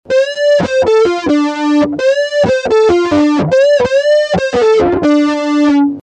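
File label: RP100 Drive And Chorus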